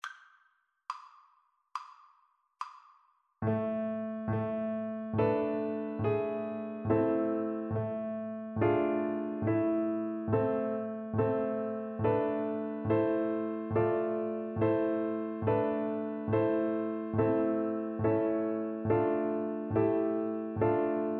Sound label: Flute; evening bells